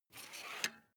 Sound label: air pump small out